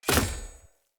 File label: Short Sword Throw Medium Impact Wood Hollow Large